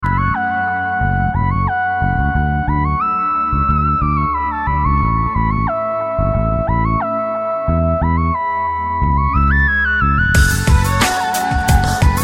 Hindi Melody Beats

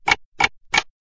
SMS Alarm

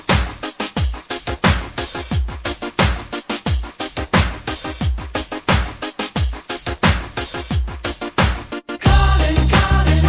calling; jazz

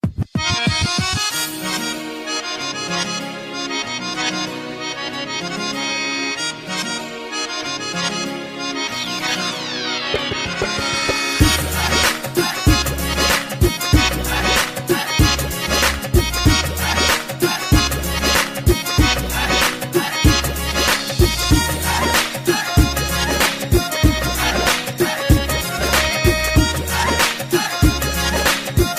Bhangra Beats Music

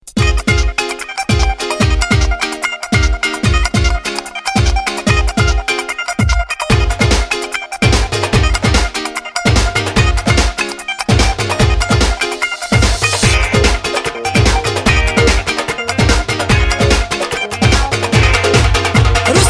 jazzy tone

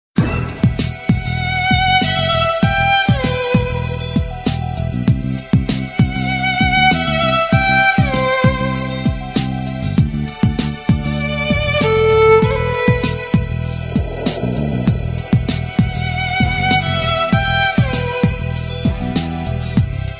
violin love beat; classical

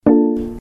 BMW i8 Chime

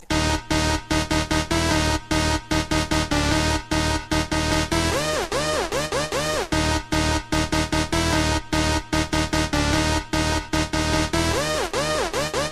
galaxy ace sms; ringtones

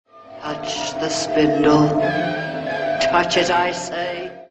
text alert; sleeping beauty; touch the spindle

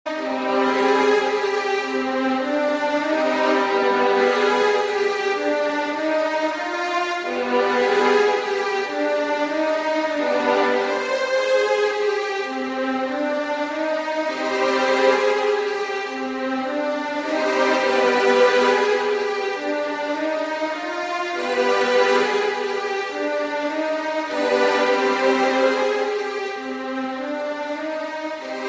violin; max payne

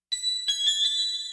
ring ding ding

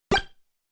mario fireball

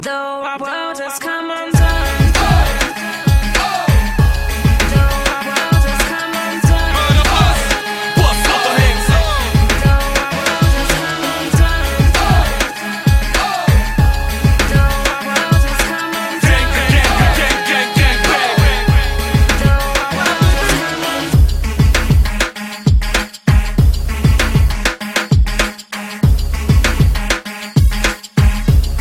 gangsta beat; hip hop

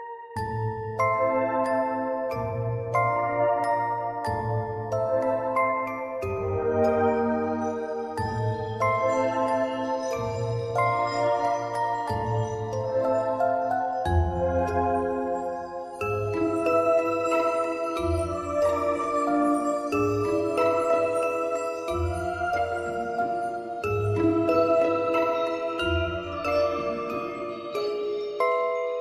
Mysterious Tone

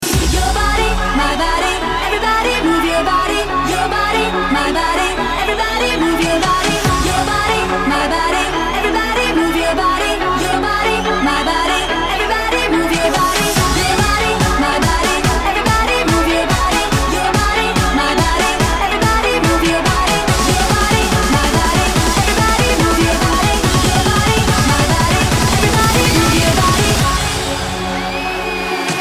trance rave

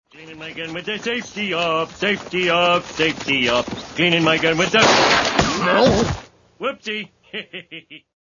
cleaning gun with safety off; homer simpson

